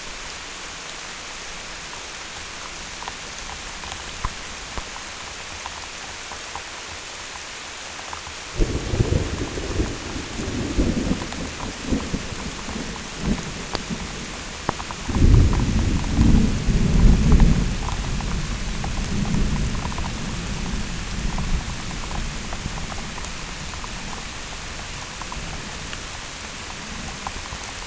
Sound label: THUNDERSTORM; Open-Field-Recorder